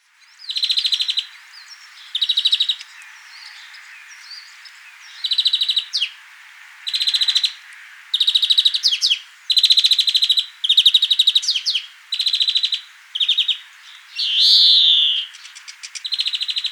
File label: Chloris chloris; SETE